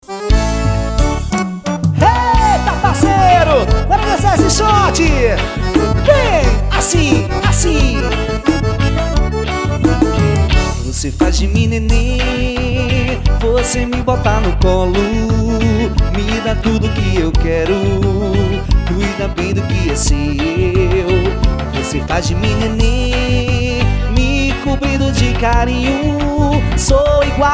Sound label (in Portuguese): forro ao vivo